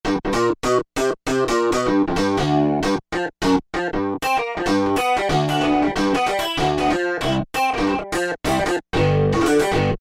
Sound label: Pick Guitar, Korg M1; Factory preset examples